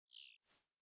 sting bird chirp; Rust

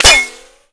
impact metal; Modern Warfare

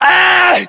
melee attack

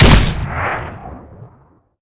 50cal

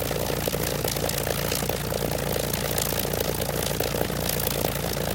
engine plane rpm00 close; War Thunder

